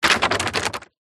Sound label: foley bottle plastic full roll ground